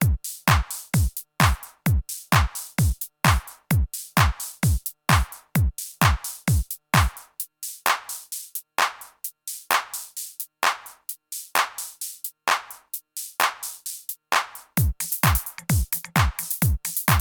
Erica Synths Drum Ensemble

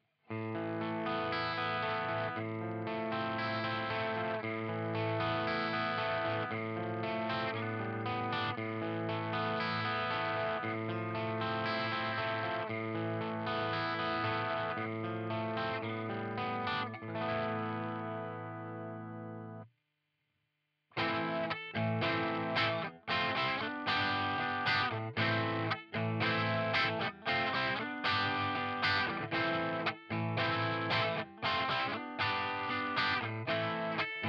Epiphone Coronet crunch

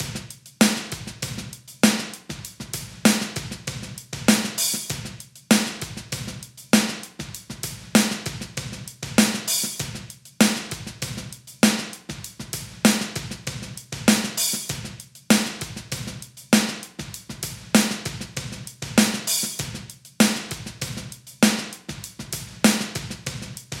Channel Drums Original; Flux Evo

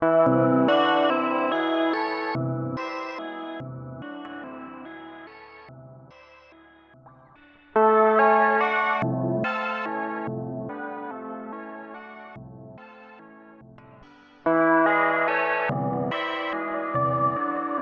Strymon NightSky Sequencer

